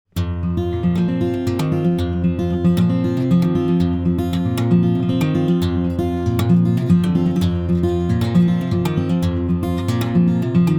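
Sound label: Finger Pick Guitar Dry; v76 pre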